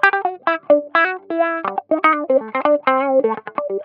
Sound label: Guitar Wah Loop, 125bpm; Zero-G; Nu Disco